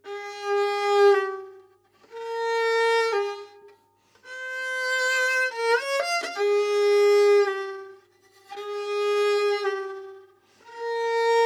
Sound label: Modern Bow Bridge Below f holes